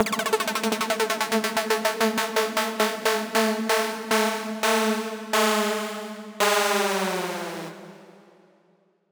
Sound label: Downlifter 125BPM; Unit Rcrds